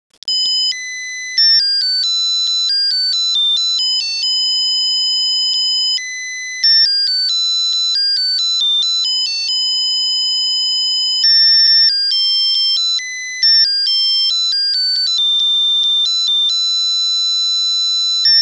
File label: Melody song